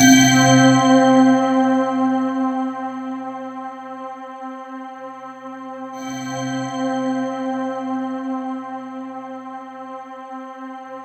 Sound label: C4, Synth Line; 90 s Sample CDs; NiceBeats; SynthLine VOL-26